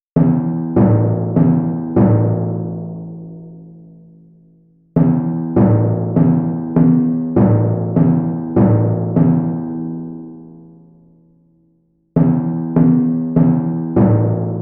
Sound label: timpani